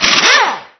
Repair; 暴力赛车

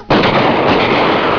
SHOOT, 射击; 特酷声音素材库

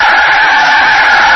Skid; 暴力赛车

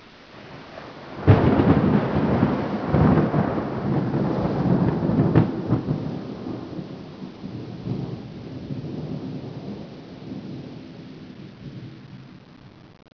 Thunder; 特酷声音素材库; 自然; 雷声

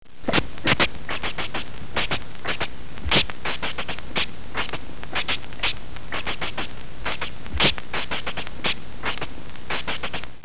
Bird; 动物; 鸟; 特酷声音素材库

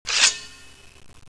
Fight; 特酷声音素材库

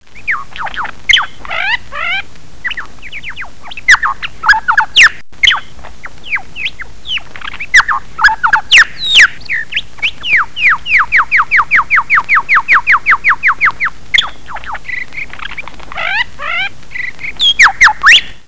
Bird; 动物; 鸟; 特酷声音素材库

